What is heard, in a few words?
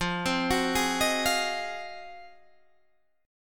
F Minor 7th Flat 9th